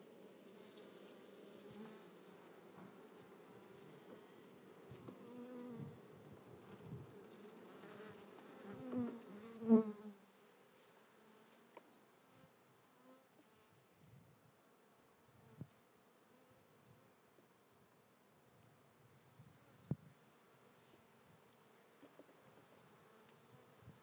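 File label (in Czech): vcela, bee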